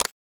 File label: continuous shot front, LG Stylo 4 Plus ui